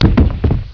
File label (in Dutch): POUND, Klappen en Vallen; sound effect